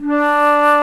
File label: SOLO WINDS